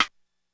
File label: Rimshot, Korg DSS-1